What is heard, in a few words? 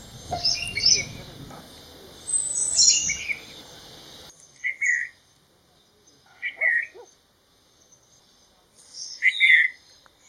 Himalayan Bulbul, Nepal; Sarangkot Gandaki Zone Western Region